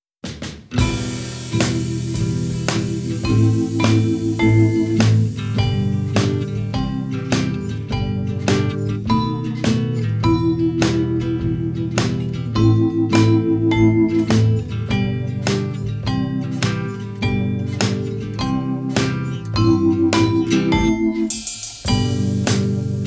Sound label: Rehearsal Instrumental